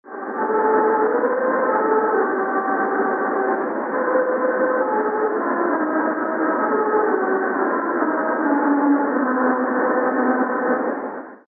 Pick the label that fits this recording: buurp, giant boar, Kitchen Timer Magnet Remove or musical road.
musical road